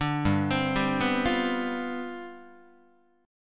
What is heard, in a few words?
G 11th, Guitar; arpeggiated